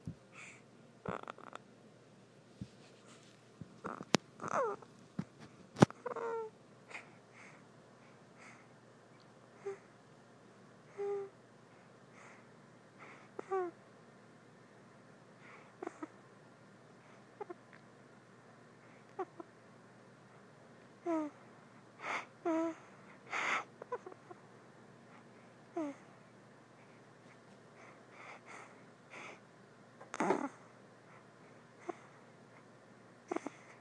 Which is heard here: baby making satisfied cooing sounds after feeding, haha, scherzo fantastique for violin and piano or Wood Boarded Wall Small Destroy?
baby making satisfied cooing sounds after feeding